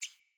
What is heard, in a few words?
Bird Single Chirp; Paragon Bird Guide